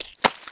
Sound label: wheat paste apply